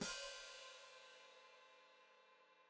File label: Hat Closed Open, Hi-Hat